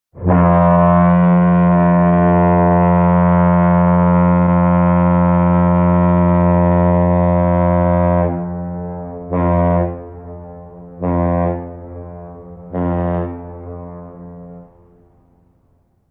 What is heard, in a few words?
Underway whistle